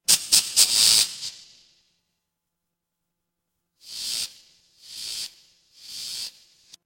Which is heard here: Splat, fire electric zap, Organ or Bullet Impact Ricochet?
fire electric zap